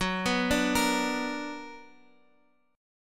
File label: B Minor Major 7th Gb, chord